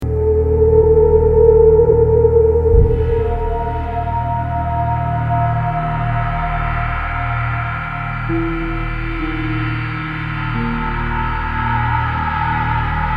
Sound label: Explore Loop; Star Trek Deep Space Nine The Fallen